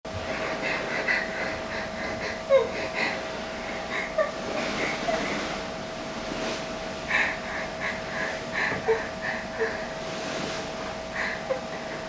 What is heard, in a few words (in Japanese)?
えち音声